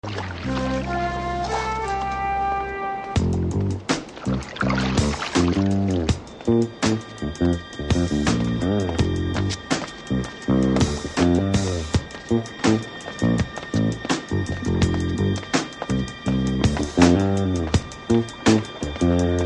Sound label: natatorium groove